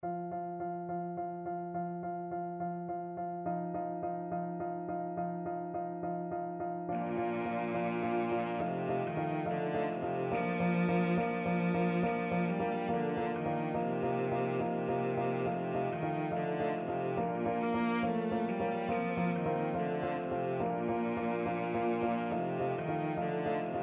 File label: the tortoise, cello